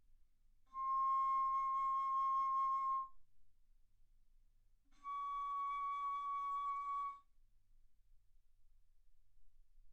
Bass Flute C6 Db6; ff

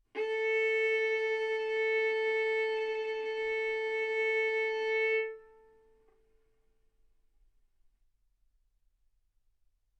Violin arco A4 B4; mf; sul A; stereo

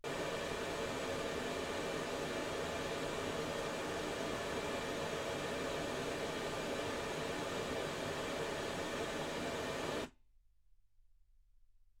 pink noise 48kHz

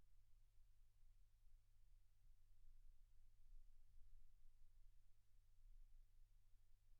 silence 48kHz

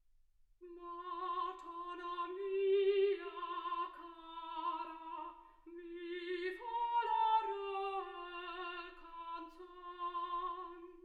soprano

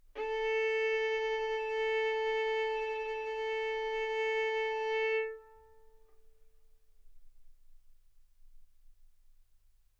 Violin arco A4 B4; mf; sul A; stereo

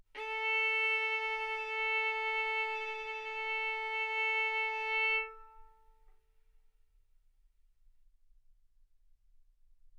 Violin arco mf sul A A4 B4 stereo

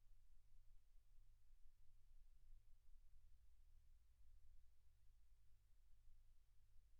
silence 48kHz

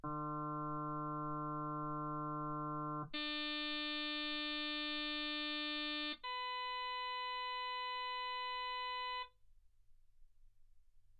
harm sinus 48kHz

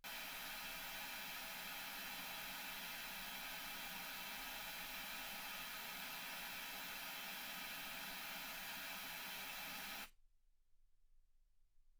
wgn 48kHz

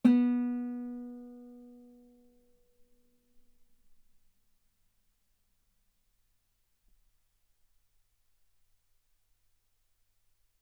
Guitar B3; ff; sul B

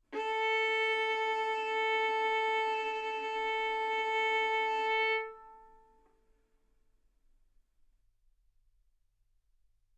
Violin arco mf sul A A4 B4 stereo; single mic

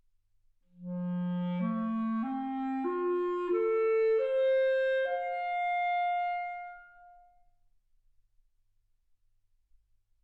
clarinet

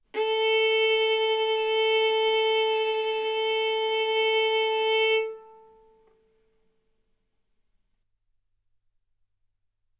Violin arco A4 B4; mf; sul A; stereo

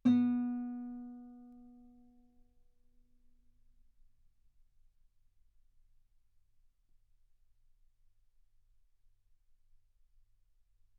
Guitar B3; ff; sul B

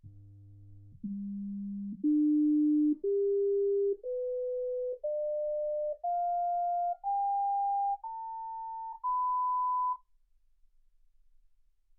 sinus tones 48kHz

